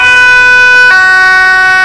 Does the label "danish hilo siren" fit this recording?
Yes